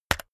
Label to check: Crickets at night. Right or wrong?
Wrong